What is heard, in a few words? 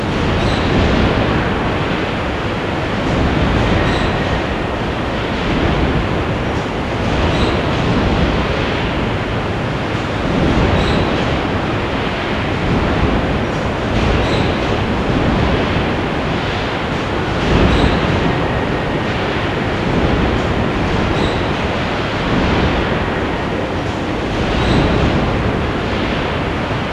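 ambience industrial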